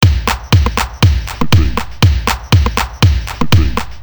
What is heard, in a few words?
depressing rock music